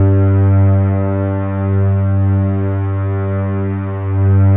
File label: Contrabasses, G1; Best Service ProSamples vol.51 - Classic Orchestra 2